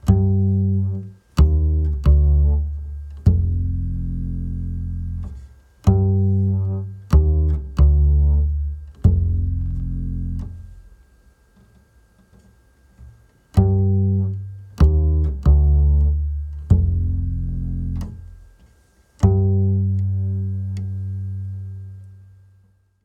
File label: solo